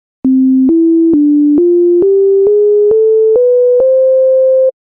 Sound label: sine wave